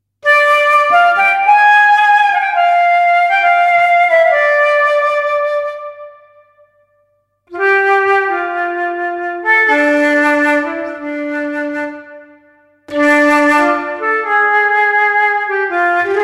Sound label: WOODWIND CALL